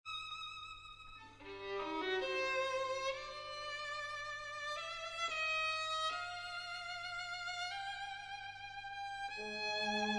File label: violin concert